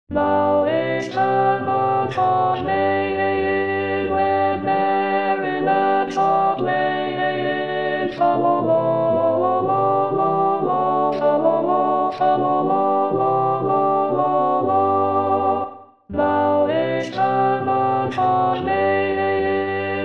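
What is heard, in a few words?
Maying Alto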